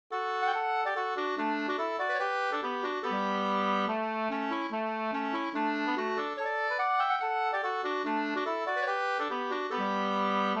reinlender

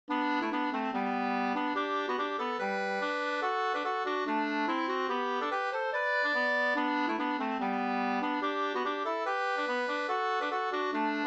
schottis

